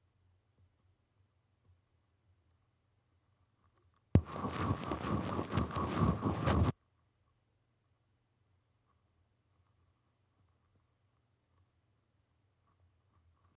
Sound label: Microphone Silence then Tickle Test